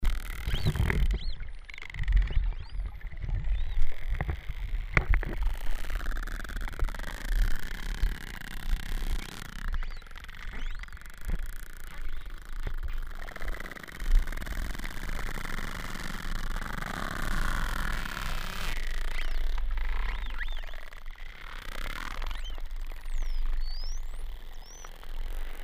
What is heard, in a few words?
Bottlenose dolphins